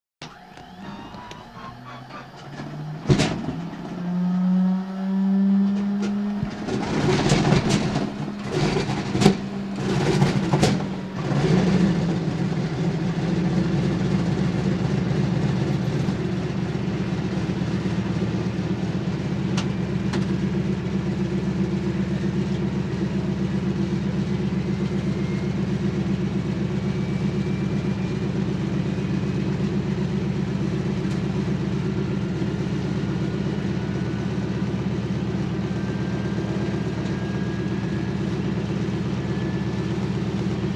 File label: Prop Plane Interior, Interior Harvard